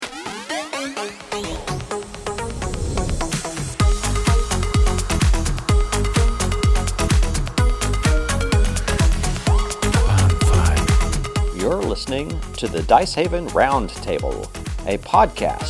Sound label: intro house; Dicehaven